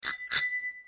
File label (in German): Fahrradklingel Zwirn